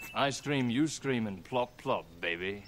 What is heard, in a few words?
plop plop baby